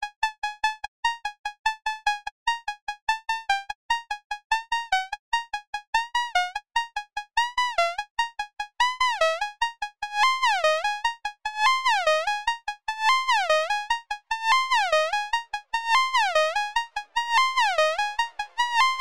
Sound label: Techno